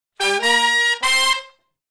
Shuffle Blues Riff (C) high unison, Horncraft for R&B; Sections